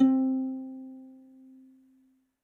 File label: INSTR Harp (C); Melodic One Shots; Cymatics; GHOST (Beta Pack)